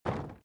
SFX build wood; Out of Reach: Treasure Royale

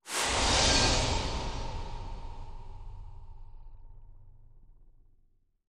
Music Stingers Stinger Death; rear channels; Hunted: The Demon's Forge; x360

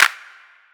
drums clap rev; Just Dance 2018

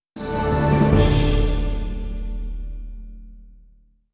Level End 2 Stars; Shadowhand; macos windows gamerip 2017

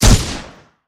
assault rifle fire; gamerip; shattered horizon 2009

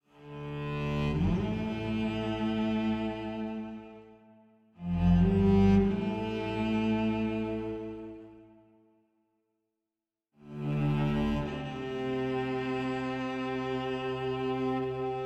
cello; fatebinder; Tyranny Gold Edition

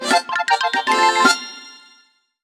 Voice Command Taught!

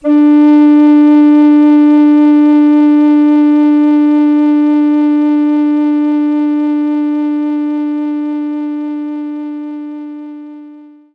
Recorder D3

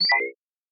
Error

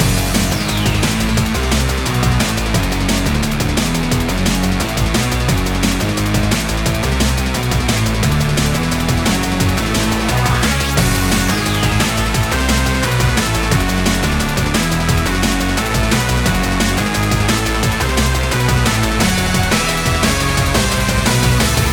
BGM ramen

